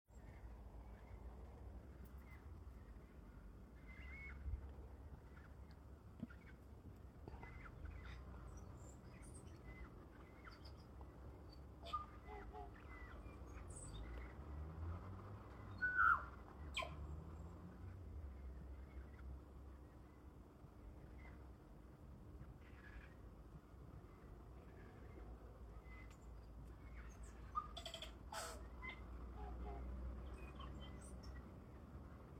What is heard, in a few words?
birds